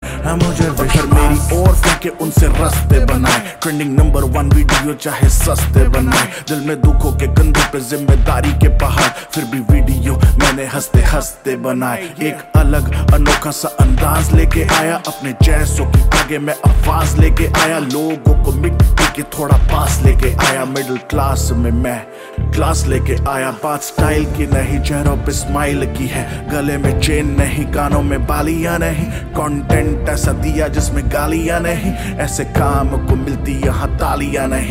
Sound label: Rap, Hindi